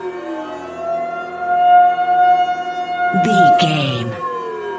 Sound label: alarm siren loop